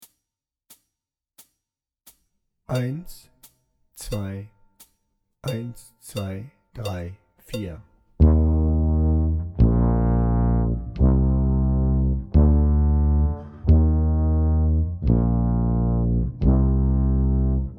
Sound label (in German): March Tuba lauter langsamer